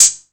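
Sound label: 808 open hi hat; 808 DRIVE; Wizoo - Platinum 24 Electronic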